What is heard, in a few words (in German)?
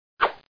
schaufeln